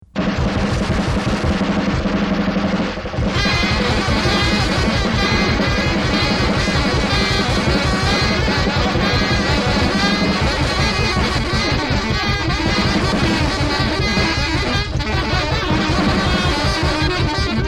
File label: saxes in cut time